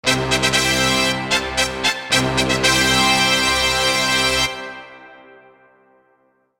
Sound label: FANFARE